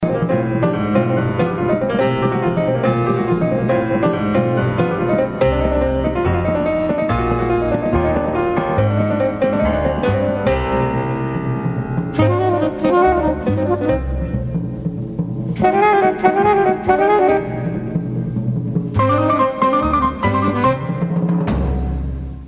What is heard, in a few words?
jazz